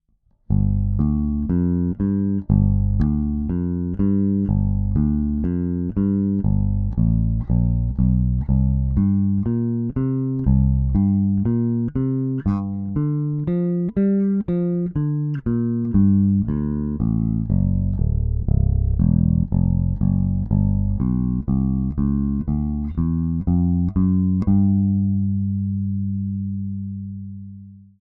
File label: AER AMP One Two Three Low Mid boost